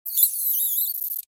rat agroed; STALKER; customnpcs

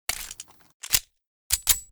tt33 reload; STALKER

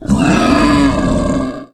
mboar death; STALKER; customnpcs

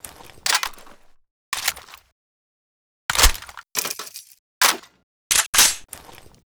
rpd reload; STALKER

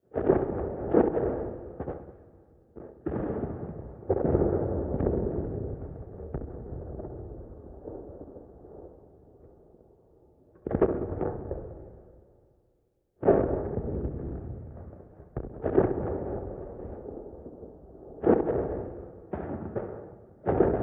ambient shooting; STALKER

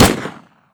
mp5 shoot; STALKER

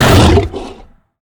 chimera pain; mutants; STALKER; customnpcs

